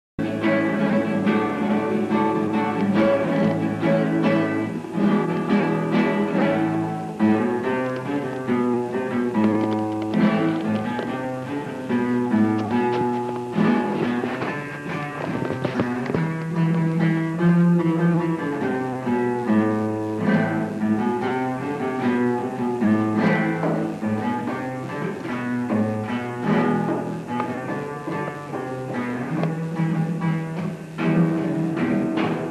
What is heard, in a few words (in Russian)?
Gitara